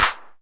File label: Roland CR-8000 clap; DRUM MACHINES